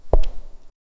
footsteps

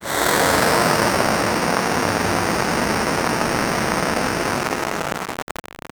sound of a bomb fuse, retro game

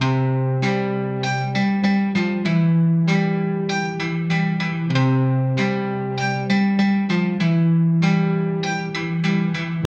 98 bpm dark sitar

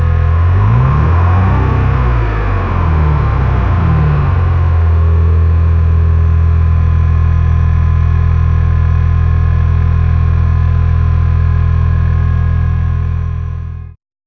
the sound of a v6, automotive

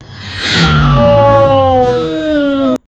an unsatisfying winding; human emotion

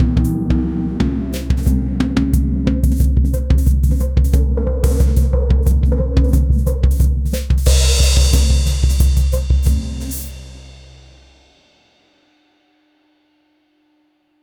ritual mystical drumms

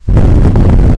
flame burning start

